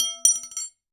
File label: Aluminium Tube Drop